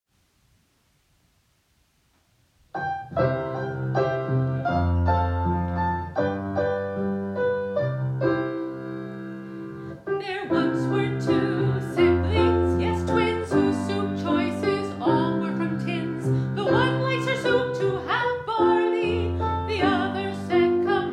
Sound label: singing